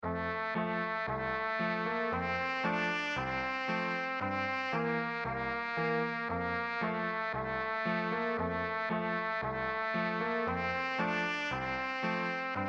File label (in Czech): pod nasim okynkem trubka klavir; Pod naším okýnkem - česká národní